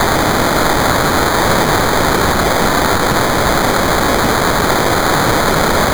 Redux Noise; Ableton Live 12 Lite; Vector; Sprites

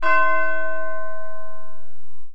Bell; To the Moon

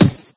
ball hit hard; There; ThereClient; thereball

